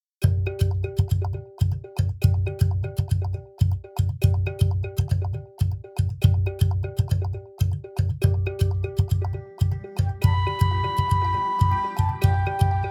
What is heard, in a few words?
Afrobeat Kalimba